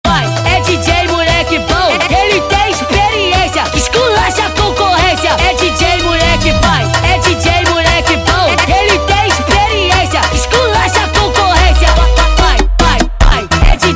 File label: Funk Nightcore